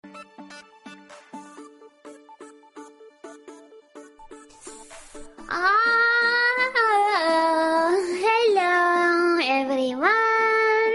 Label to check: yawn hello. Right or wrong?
Right